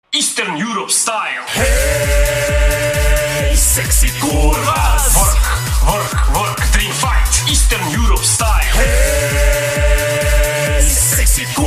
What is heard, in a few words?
Eastern Europe Style